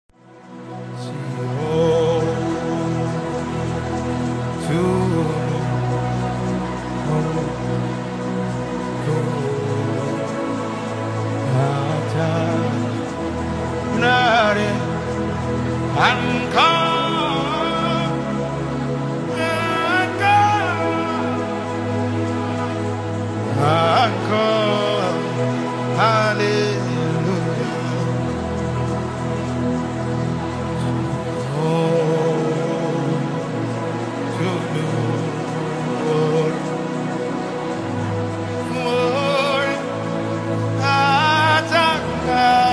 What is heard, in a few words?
Prayer Ambience